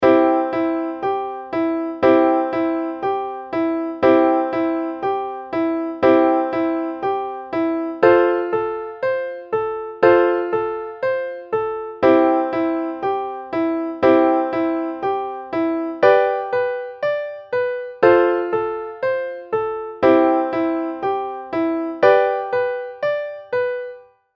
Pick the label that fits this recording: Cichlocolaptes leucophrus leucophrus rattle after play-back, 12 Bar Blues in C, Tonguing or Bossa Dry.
12 Bar Blues in C